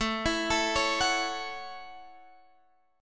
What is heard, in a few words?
A Major Add 13th A, chord